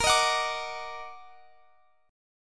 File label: Eb Minor Major 7th Bb, chord